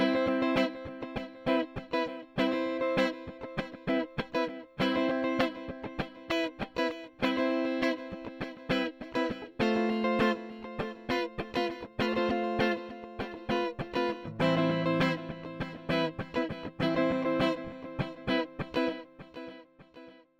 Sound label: Guitar Rythm Delay 600ms FB50 wet20